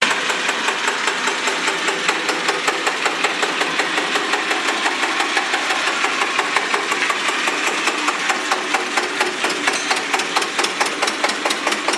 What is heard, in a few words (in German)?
Lanz Original Traktor Klingelton; Hermann Lanz Original Traktor Klingelton